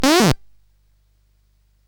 Shuffle, CSG8580R5

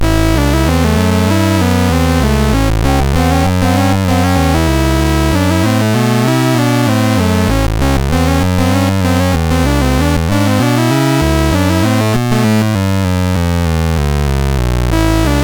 Computer Blues, MOS6581R4

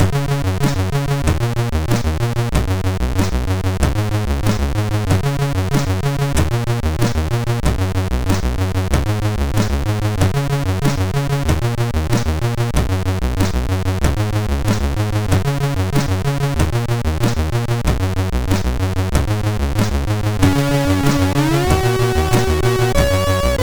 Game Complete, MOS6581

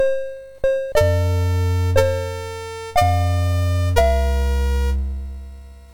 Birthday, MOS6581R4

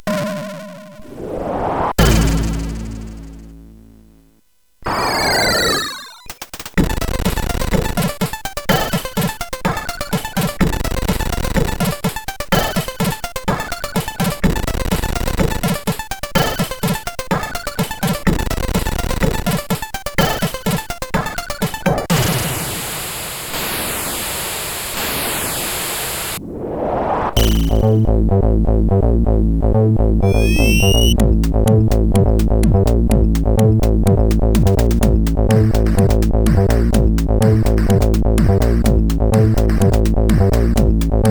Intro Music, sid CSG8580R5; hvsc

